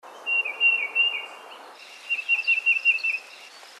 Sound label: TITMOUSE TUFTED; species/birds; wbba